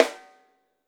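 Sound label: snare drum, Drums Acoustic; AKAI; 90s sample CD; USB Soundscan vol.10; RAW KIT 8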